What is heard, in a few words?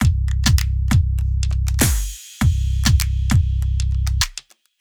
Minimal Chunk, Loops; BB 2HTC Samples Vol 4